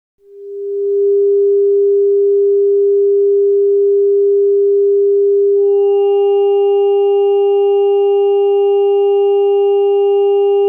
G sine, harmonics, vibrato

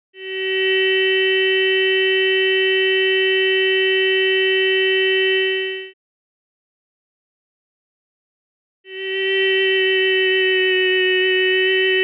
Periodic Quasiperiodic

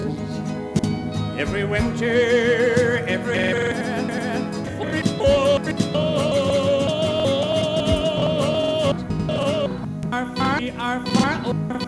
country recompose

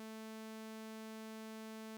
saw osc

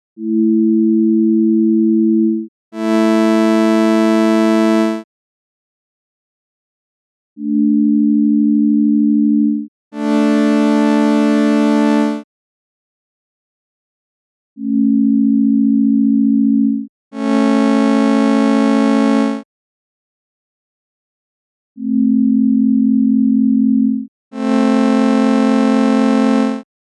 Sine vs. Complex Intervals

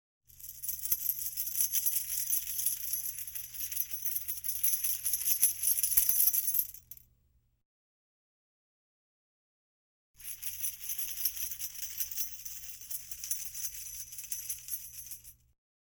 Binaural Jangling Keys

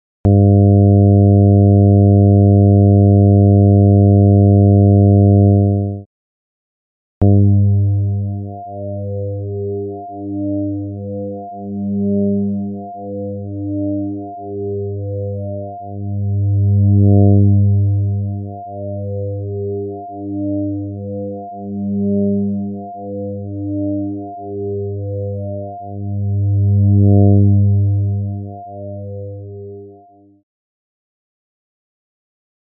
Risset's Musical Beats

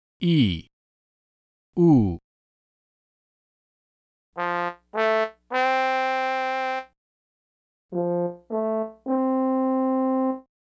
Bright Dull Spectra

